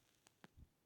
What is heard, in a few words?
Cigarette Drag